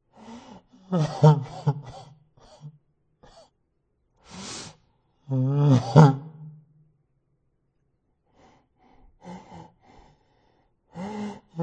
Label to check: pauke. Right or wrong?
Wrong